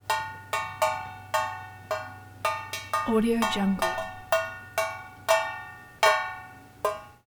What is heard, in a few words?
water drip into pot lid with light ring off; prosoundfx